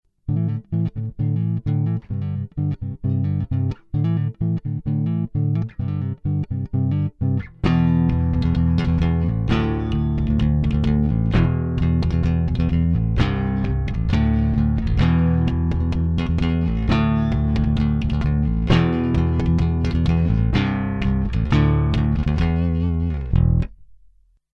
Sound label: Tube Tech PE1C Bass Fender Chords Fingerstyle LF Boost 6 Atten 6 60 Hz HF Boost 8 Atten 0 5k